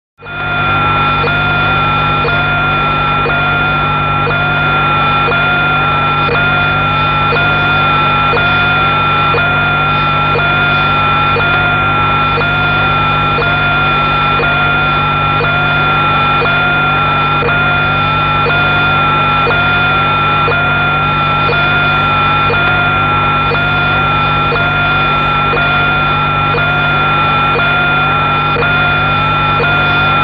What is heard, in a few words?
Radio Static Radio